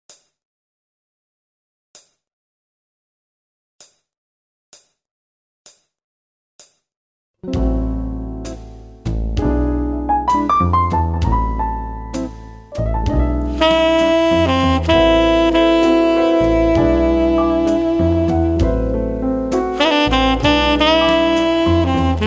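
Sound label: Jazz melody piano; MyVirtualBand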